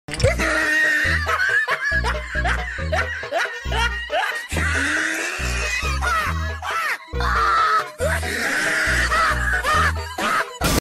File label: laughter; smg4